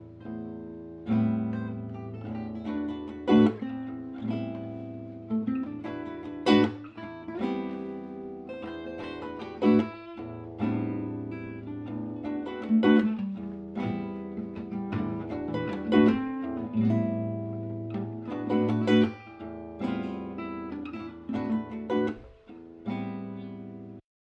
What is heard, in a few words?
Jam